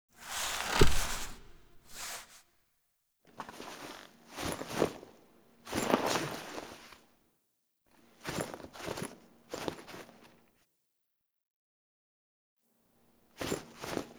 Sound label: Table For Two Foley; Cinematics; Zariman